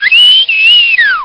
whistle

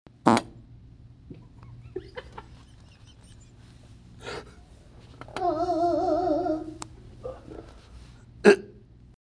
uahuahuah

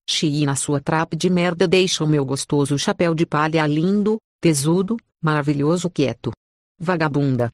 trap

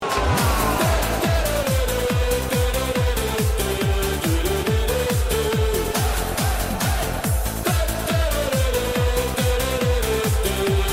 goal song; sporting

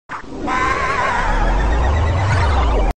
falling sound; wario land 4; wario's falling sound

